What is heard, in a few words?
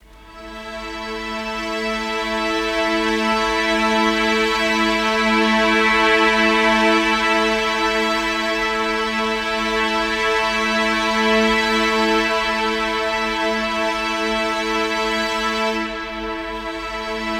Swell Strings - Am 60Bpm; Construction Kits; Ghosthack; SkyRealm; When The Bell Tolls